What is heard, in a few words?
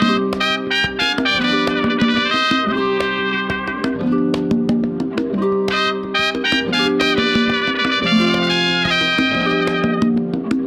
Mariachi Jingle FULL loop; WrestleQuest